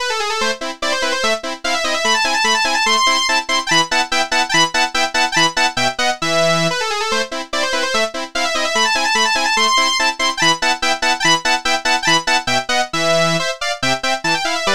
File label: saw wave